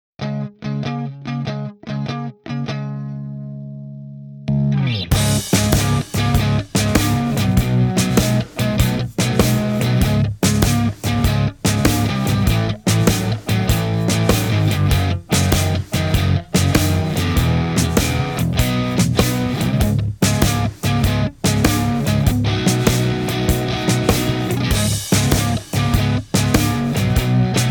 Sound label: Delta Blues